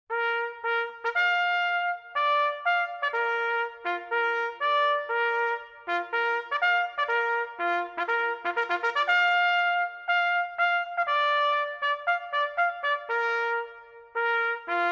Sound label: Retreat Low Volume; At 1700 (5pm); D Troop,14th Armored Cavalry Regiment — Fulda, Germany; a 105mm howitzer was fired, followed by To The Colors as the flag was lowered